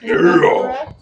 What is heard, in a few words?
yeahhh; meme